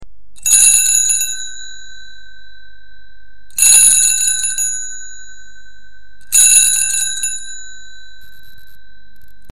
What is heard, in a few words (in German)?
grosse Klingel